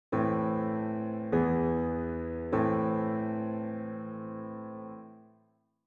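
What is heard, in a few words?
A minor perfect authentic cadence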